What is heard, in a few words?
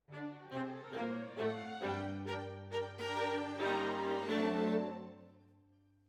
Violas, jazz for piano